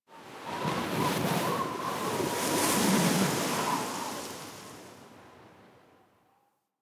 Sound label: Eidolon Wind Gust Large, Ambience Plains; Warframe